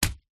food bag of rice set down on surface; zapsplat

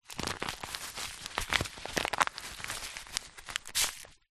food small packet of cous cous handling; zapsplat

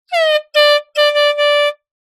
sport air horn soccer blast tune; zapsplat

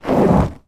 leather chair cushion lift up; household

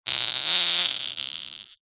human fart dry forced

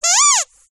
toy squeaky squeeze single

